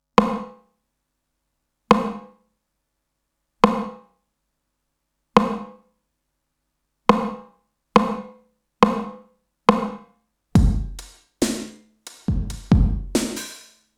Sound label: TR909; OTO BAM